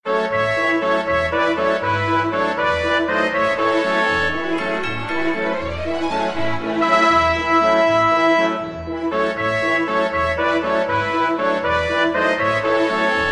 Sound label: Capriccio Italian Italian Ringtone